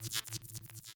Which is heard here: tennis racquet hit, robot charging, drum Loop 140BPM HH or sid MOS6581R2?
robot charging